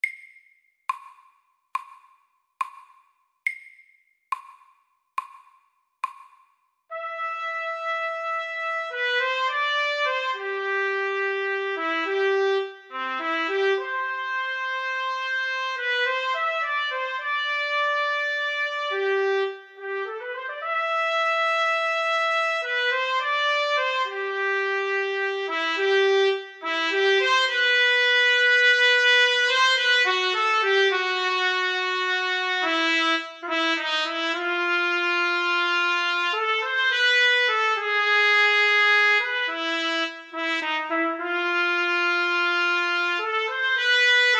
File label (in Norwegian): Etyde i D Dur FORT